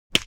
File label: Slap Clean